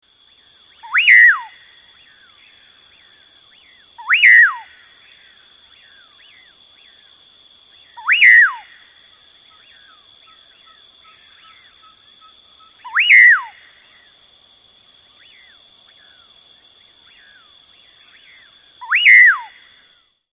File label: Common Paraque